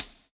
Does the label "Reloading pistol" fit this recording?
No